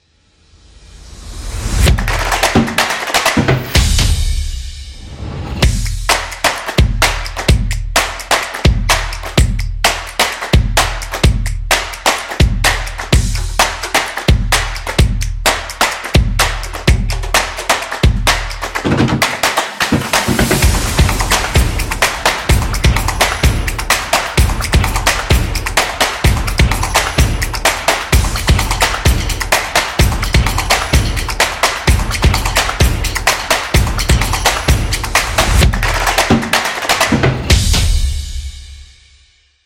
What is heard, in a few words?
Stomps